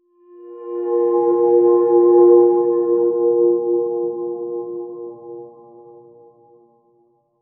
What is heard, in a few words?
deep pure c2 tone triangl; pad